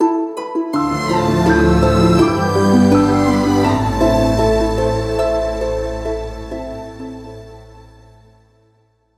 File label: Game Over Screen